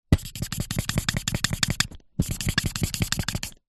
office marker large colour in whiteboard

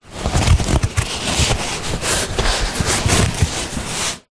sport exercise mat foam thin rolled up handling

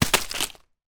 stick branch fall on forest floor; foley

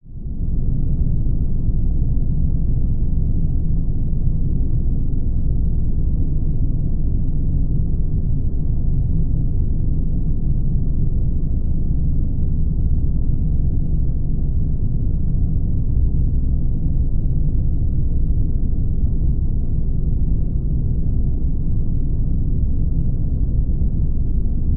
industrial space huge abandoned drone dark eery

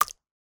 horror blood drip on flesh guts; sound effects one

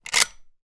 gun model 1894; still north media

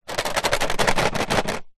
office colouring pencils in tin shake

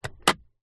transport car glove compartment open

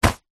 foley raincoat jacket grab hand; zapsplat

